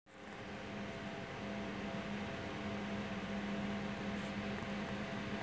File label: ML240R no cover